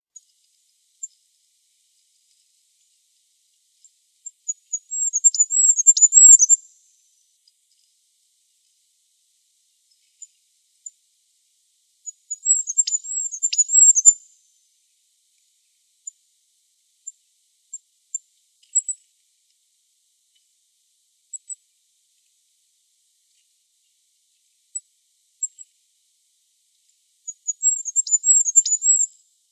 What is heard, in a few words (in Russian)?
golos korolka